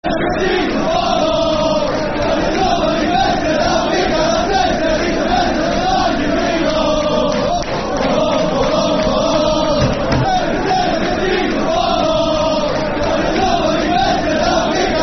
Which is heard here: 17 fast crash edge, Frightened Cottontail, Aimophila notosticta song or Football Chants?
Football Chants